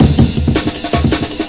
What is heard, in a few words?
161 hyper, drum loop; 161 to 180 bpm